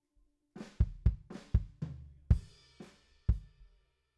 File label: BD OUT